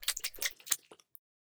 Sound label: lick; soundscrate